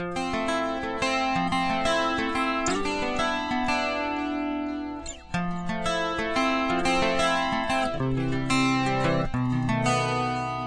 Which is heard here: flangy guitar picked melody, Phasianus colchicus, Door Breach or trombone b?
flangy guitar picked melody